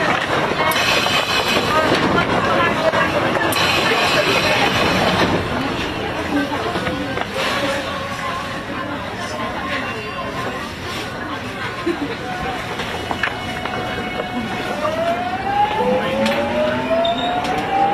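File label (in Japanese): 走行音, 4号線, ソウル地下鉄; Seoul; ソウル(Seoul)-会賢(Hoehyeon)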